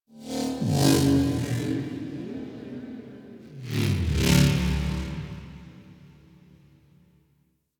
Tech Texture Med, Ambience; Warframe; Cephalon Weave; DE

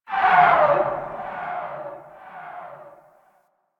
Distant Creature Shriek; Ambience; Warframe; Quests; The New War; Narmer Outpost

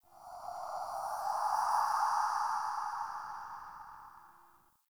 Under City Spirit Gust

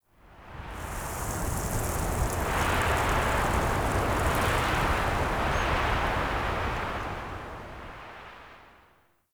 Beach Wind Gust; Midrath Beach

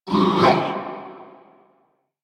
Impale Cast Vocal; Warframe; Warframes; Hoplite

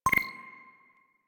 UI PM Received; Warframe

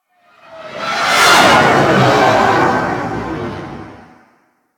Ship Close Fly By, Engines; Warframe; Vehicles; RailJack; RailJack Enemy Ships; Grineer; Engines Generic

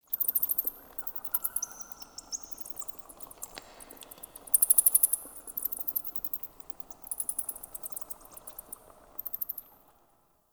Cave Bats; Ambience; Dungeon Island; Duviri; Warframe

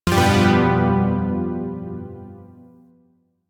Shawzin Maj Chord J; Chords; Warframe; Zariman Shawzin

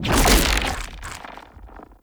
arm turret throw; Warframe; Man In The Wall

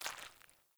Grunt Boot Walk Water; Sinecure

